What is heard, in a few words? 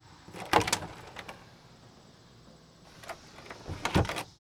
Opening Closing House Door, Household